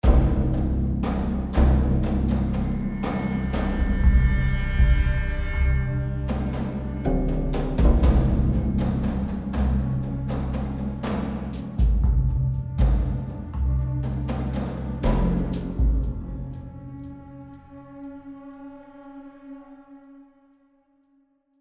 Tension; The Last of Us; AB Tension 3 High Chunk

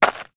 foley footstep single dirt

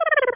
RESPAWN

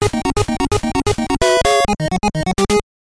Game start; bases loaded 4; nes

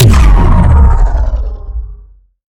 Shot Large Laser Ion Fusion

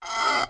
Squeak

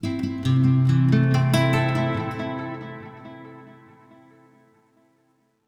Oneshot Acoustic Guitar; zombie army 4 dead war ps4 stadia switch windows xbox one gamerip 2020

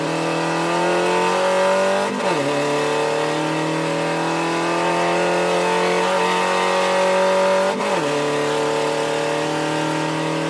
Turbo

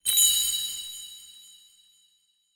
Suzu; Yu-Gi-Oh! Master Duel

